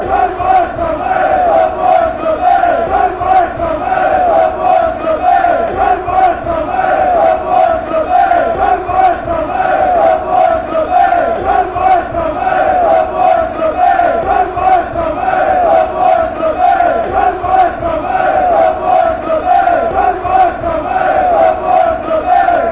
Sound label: beerschot chant